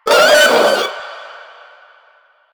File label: Proto Infested Runner Vocal Short Attack; Warframe